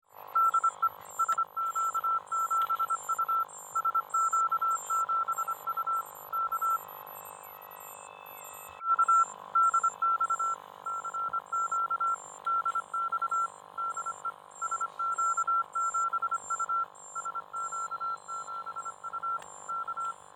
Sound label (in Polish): lampa homodyn cw; radioamatorskiej